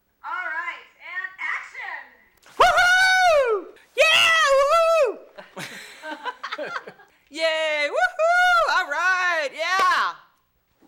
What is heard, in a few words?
Hoorays